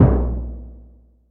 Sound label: mid tom one shot b key